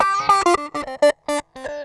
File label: SQUEEZER, Funk Guitar; 90 s Sample CDs; Zero G